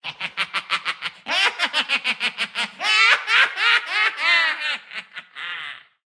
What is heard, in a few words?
Roar; Bronzo